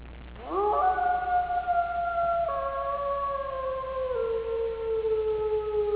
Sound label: howl